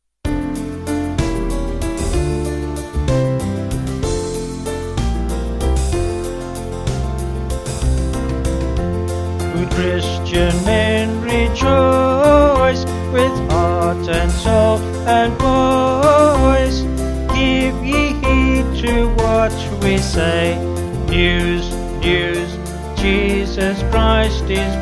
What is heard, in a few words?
Vocals Band; Good Christians All; In Dulci Jubilo